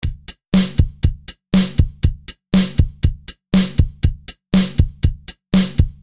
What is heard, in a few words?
drum